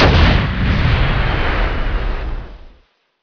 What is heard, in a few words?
AT4 law; SpecOps2 MPlayer Demo